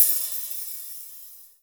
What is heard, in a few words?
HH hh; Best Service Gigapack 2